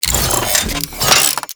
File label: Turret Deploy; Warframe; Corpus; Corpus Railjack; Crp Admiral Agent